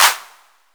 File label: clap; handclap trimpot